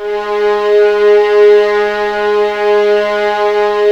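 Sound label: G#3 legato mf violins R; East West; The Ultimate String Collection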